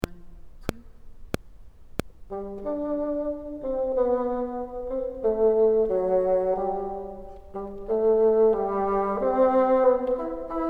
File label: top, bassoon